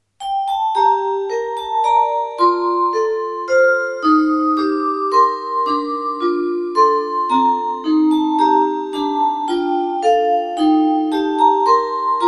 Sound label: waltz